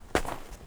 footstep trainers snow compact walk fast; Phasmophobia

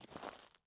footstep trainers gravel compact walk slow; Phasmophobia

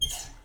Embalming on; Phasmophobia

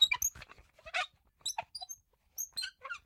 Wheelchair push; Phasmophobia

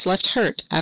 Hurt; Phasmophobia

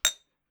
glass; Phasmophobia

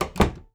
DOOR Indoor Wood Close; stereo; Phasmophobia

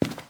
Footstep Carpet; Phasmophobia

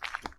Wet footstep; Phasmophobia

